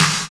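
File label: DISCO SNARE; Drums In Your FACE!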